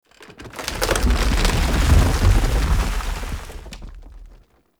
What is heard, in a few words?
Root Large Start A; Neathuns